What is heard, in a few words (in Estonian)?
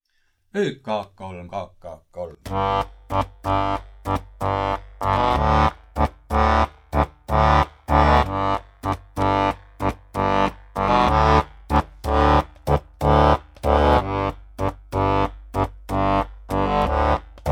Raditants akordion folk